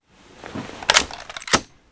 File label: Inf Weapons Foley; Red Orchestra Ostfront 41-45